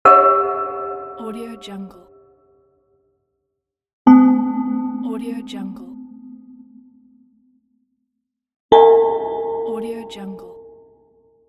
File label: bells